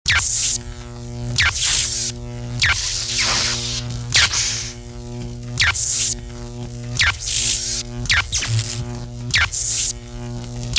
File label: Arcs and Sparks, DJ sounds